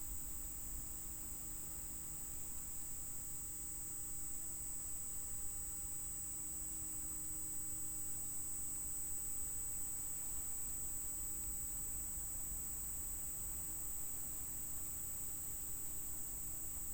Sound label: Hydrophone